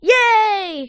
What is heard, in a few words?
Girl Pos; Food Truck Game